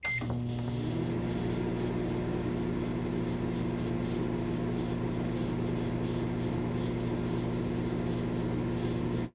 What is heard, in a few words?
Household Microwave Turn On And Run